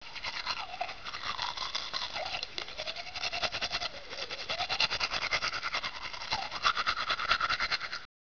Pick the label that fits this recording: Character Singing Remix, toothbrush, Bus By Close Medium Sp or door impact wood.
toothbrush